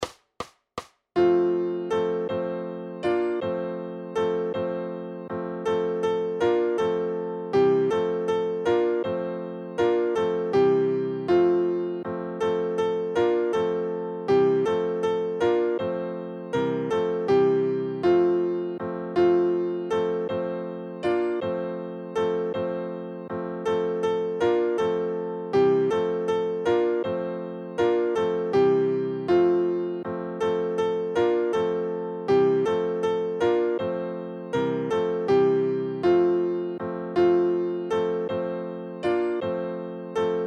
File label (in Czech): SuperKlavírKoledy; Poslouchejte, křesťané; Super Snadný Klavír: Koledy pro samouky a začátečníky